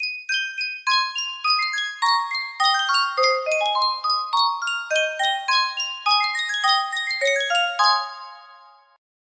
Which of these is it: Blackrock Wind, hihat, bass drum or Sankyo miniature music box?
Sankyo miniature music box